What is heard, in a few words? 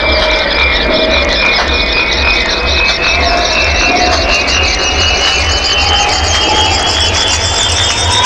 ambience charge up